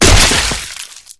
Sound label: bodyfall combat form; flood